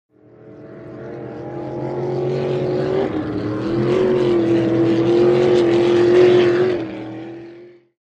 Power Boat By Fast, off shore power boat

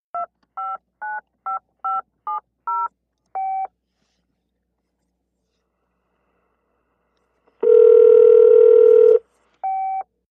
Cell Phone Inline Dial, touch tone dial; futz; touch-tone dialing & ringing; car & cell phones

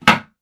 Toilet: Seat Up / Down.

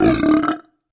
Belch Deep

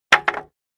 2x4 Plank Dropped On

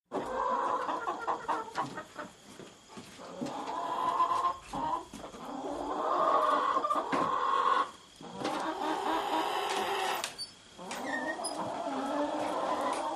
Hen house interior ( crickets in background )

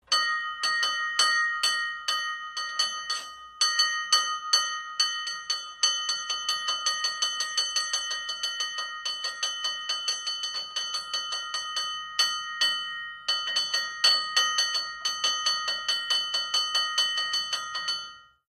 Fire Bell Loud Clangs, fire truck

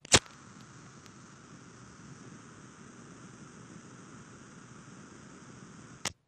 Bic Lighter Distant; lighters & matches

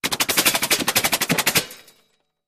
Bullet Impacts Metal

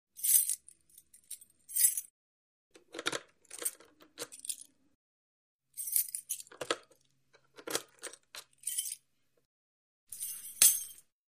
Car Key Movement Drop

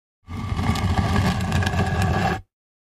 2x4 Dragged On Cement

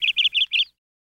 Canary Call Chirp

